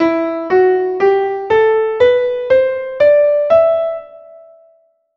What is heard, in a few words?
Mattheam scale E minor